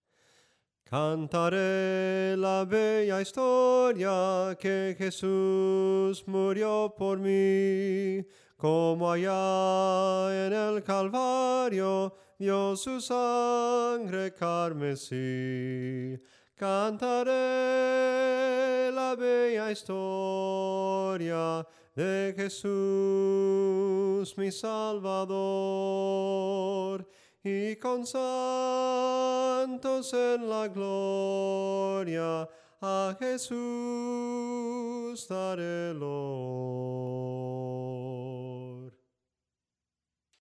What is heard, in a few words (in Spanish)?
SOPRANO CANTADO, himnos en melodia soprano